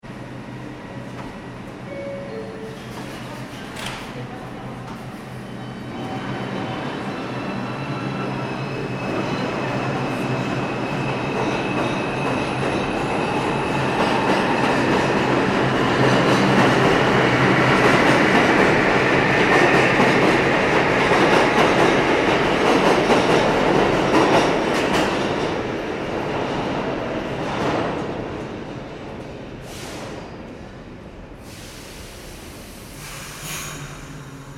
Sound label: ambience train station subway trains people; Subway Train Station ( New York City ) Ambience - Trains And People; Subways; Vehicles; McKinney Sound